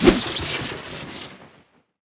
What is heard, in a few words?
dynamite throw crit; tf2